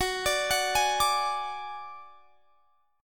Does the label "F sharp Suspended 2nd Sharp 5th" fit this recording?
Yes